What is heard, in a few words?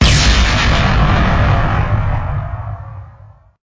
space Blast; Angry Birds Space